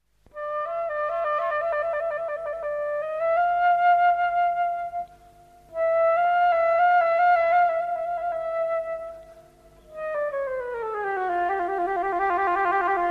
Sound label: sms tone ringtone